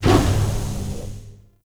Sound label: Teleports; Memory Of Betrayal; Pax Duviricus; Warframes